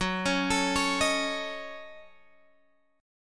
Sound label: F sharp Minor 6th, Guitar Open F; F#m6 arpeggiated; 18,20,21,20,18,x; chord shape